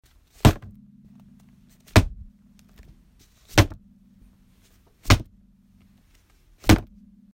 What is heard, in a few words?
Slamming Book